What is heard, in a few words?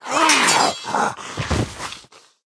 felidae dead, monster